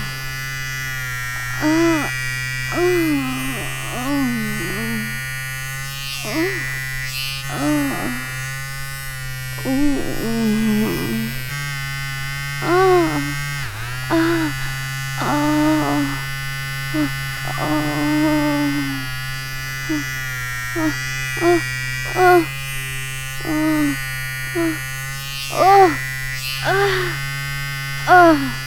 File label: Vibe; leisure suit larry magna cum laude